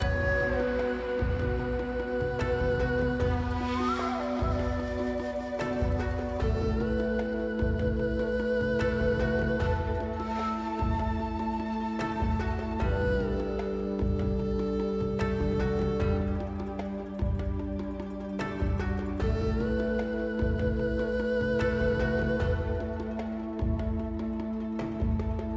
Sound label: BGM Main UI; Kungfu Showdown